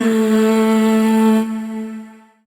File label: Void Shawzin Oct One A; Warframe; Zariman